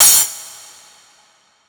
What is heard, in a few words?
normal hit clap; osu!